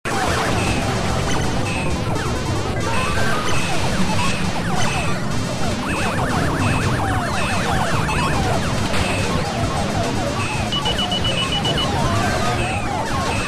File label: arcade